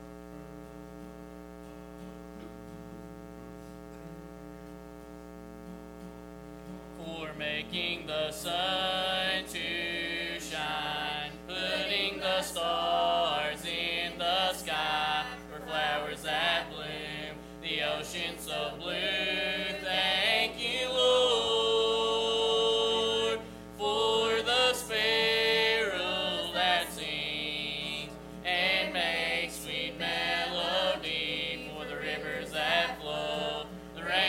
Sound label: singing Family